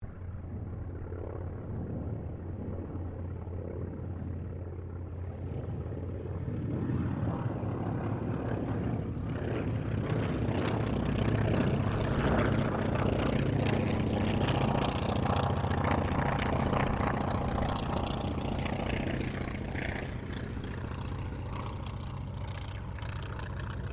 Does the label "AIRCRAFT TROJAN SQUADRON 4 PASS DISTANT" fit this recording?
Yes